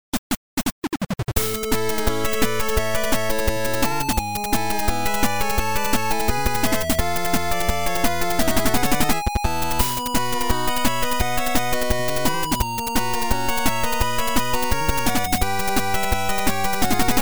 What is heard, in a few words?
8 bit mix